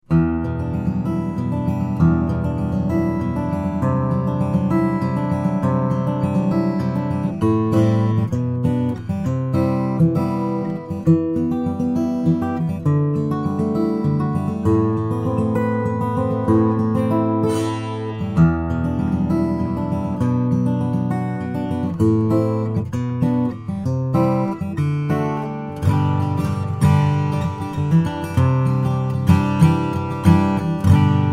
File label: slope dreadnought, steel string guitar flattop; traphagen dake; cascade